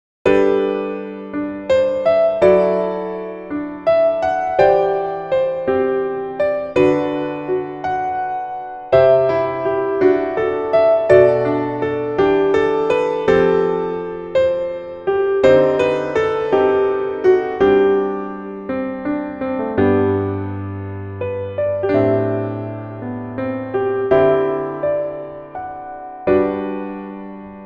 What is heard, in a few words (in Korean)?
Piano MR 멜로디MR 여자키